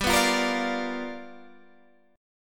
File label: G 7th Sharp 9th G, Guitar DADGAD; 17,14,15,15,17,15; down